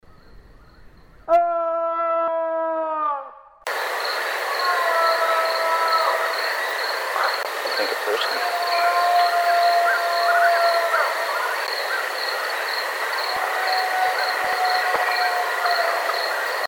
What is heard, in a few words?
Three Calls